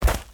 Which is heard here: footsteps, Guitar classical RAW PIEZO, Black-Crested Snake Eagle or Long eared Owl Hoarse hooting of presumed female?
footsteps